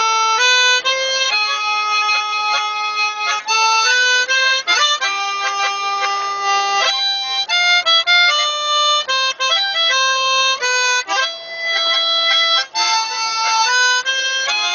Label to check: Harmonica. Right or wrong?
Right